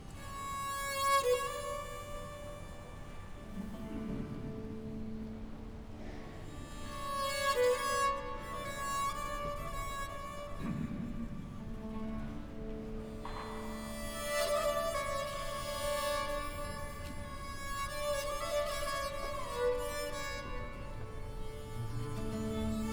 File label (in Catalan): Improvisació cistre